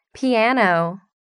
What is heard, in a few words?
piano